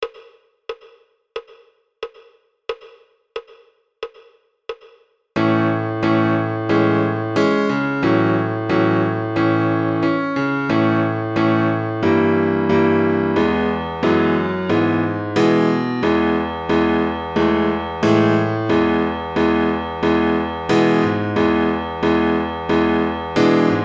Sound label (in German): uebung 2 haendig links, piano